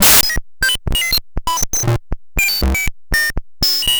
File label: random beeps; Graz